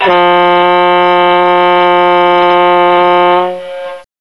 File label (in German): Horn lang